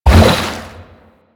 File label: Aqua Board Jump Charge Ready; Warframe; Yareli